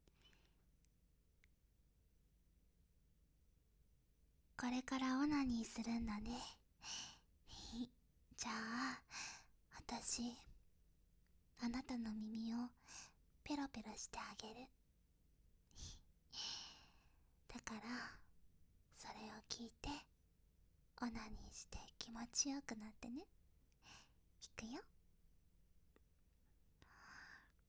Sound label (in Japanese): 耳舐め カウント バイノーラル